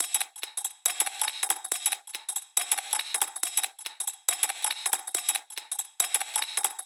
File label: Organic Percussion Loop 140 BPM